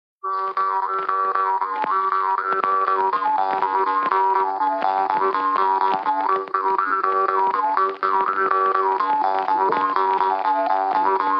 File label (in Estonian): Toropillilugu Lääne-Nigula parmupill folk